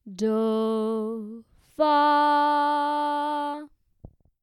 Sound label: Tonic subdominant